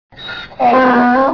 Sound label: new mail